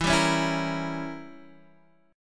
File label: E Diminished 7th; Edim7 strummed; x,7,5,6,5,6; JGuitar